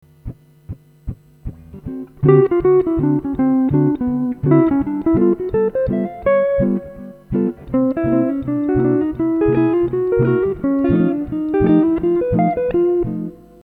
Rhythm Changes Licks Etude Bridge, guitar

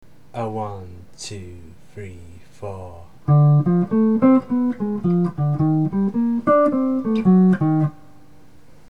i vi ii v 3 9 lick, guitar

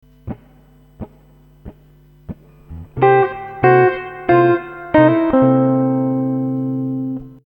Blues Guitar Licks Example

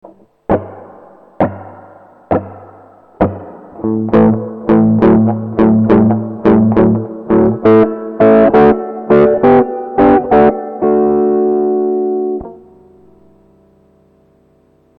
shuffle triad, guitar

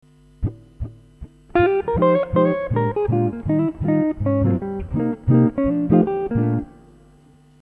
Rhythm Changes Licks Etude, guitar

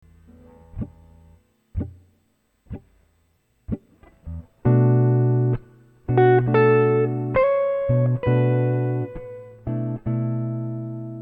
Minor Blues Licks Example

